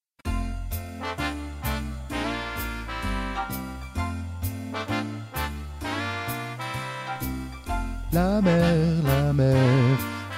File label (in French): mer alto